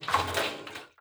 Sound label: contents, metal barrel; physics; props